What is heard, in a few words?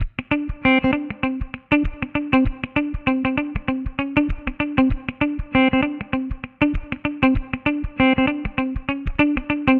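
Guitar R&B D major 98bpm; Guitar and Bass; Ableton; Factory Packs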